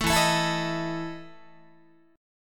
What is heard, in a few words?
E Major 7th Suspended 4th E down Guitar